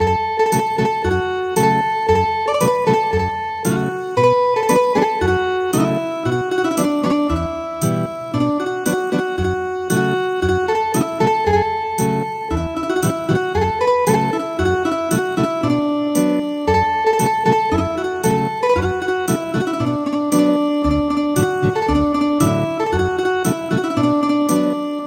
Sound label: Ethnic Guitar